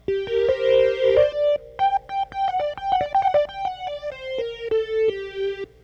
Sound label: Nord Lead 4